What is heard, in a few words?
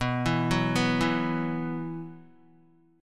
B Suspended 4th, Guitar; Bsus4 arpeggiated